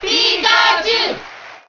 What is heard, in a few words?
Cheer Japanese; Pikachu; SSBM